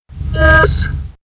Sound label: bit yes; tron